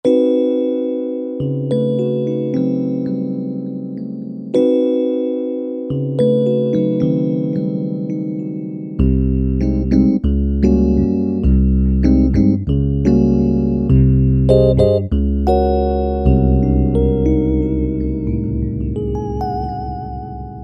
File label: Hiphop EP, Roland SR-JV80-12 Hip Hop Collection